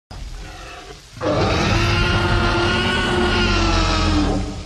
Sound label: T REX ROAR SOUND EFFECT; meme sounds